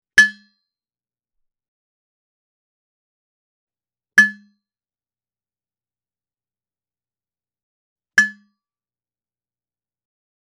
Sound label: Udu (Ibo) Bottom Snap